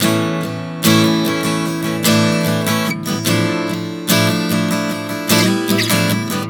Prog 074 A-Dm, Acoustic Guitar, Chord Progressions 074 bpm; Sonic Foundry; Songwriter Acoustic Guitar Companion